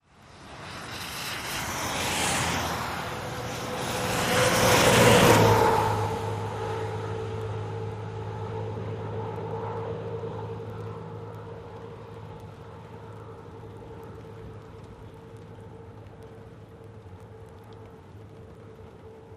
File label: One car and one truck drive by on wet pavement of a quiet road.; Car By, Rain Wet Bys Wet Traffic